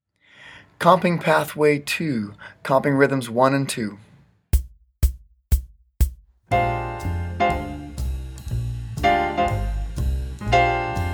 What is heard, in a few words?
comping rhythms